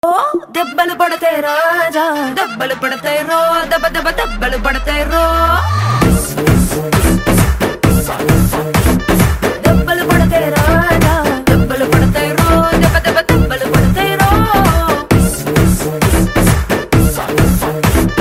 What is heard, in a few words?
tamil dance song